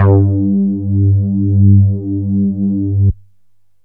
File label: synth bass